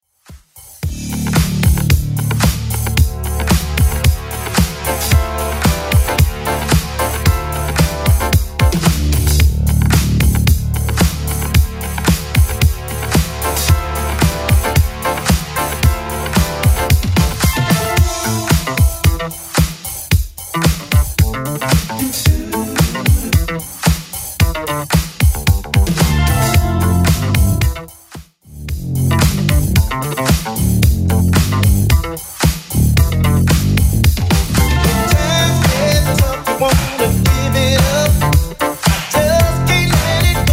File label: 80s Club Edit 112bpm